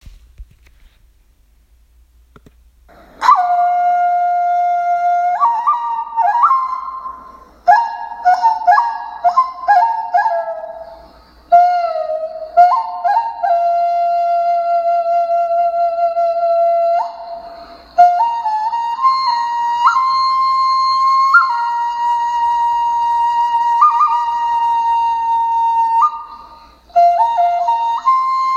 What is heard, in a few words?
koudi bone flute